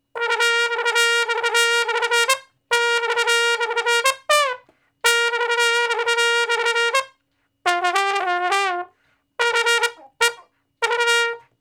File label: Trombone Shuffle (G); Sonic Foundry (Sony Creative Software); Horncraft for R&B; Solos